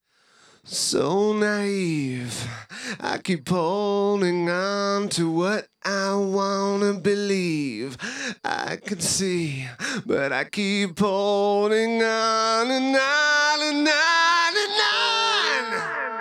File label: lead vox